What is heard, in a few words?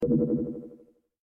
Notify - Update